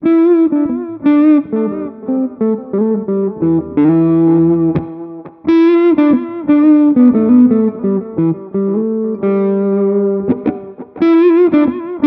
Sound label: Mooer GE300 Lite Pop Drive